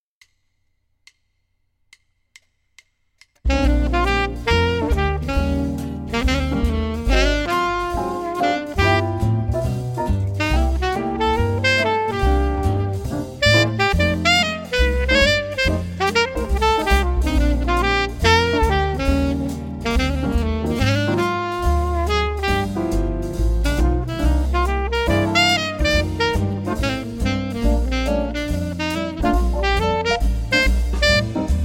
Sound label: alto sax swing